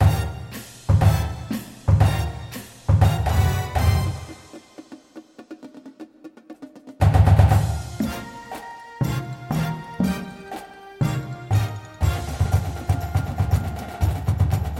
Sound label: bass w music